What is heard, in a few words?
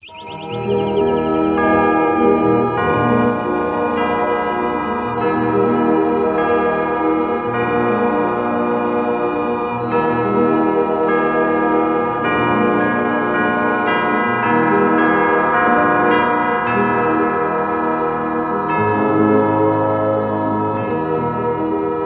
BELLS; RAI